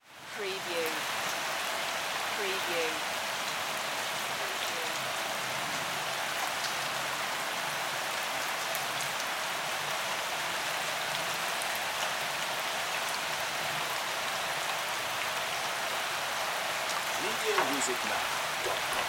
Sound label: Rain Pour Splatter